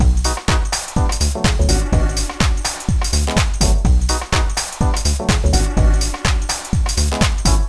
house

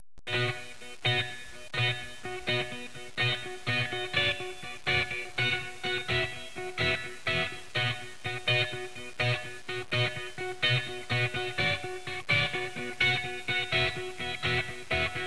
Rock

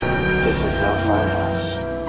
no funhouse

